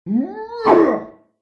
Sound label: human male diver attack voice